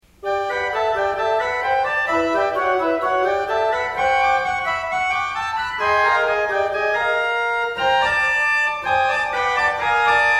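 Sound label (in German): Mixtur Solo, Orgel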